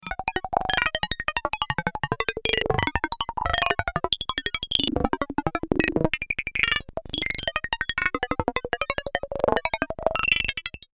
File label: burst ring